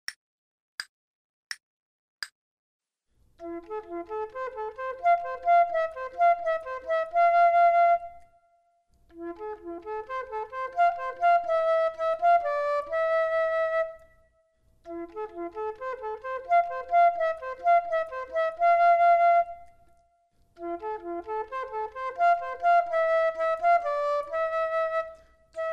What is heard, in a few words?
Duets flute; Selected Duets for Flute; flute 2